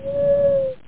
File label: owl; Strategy Companion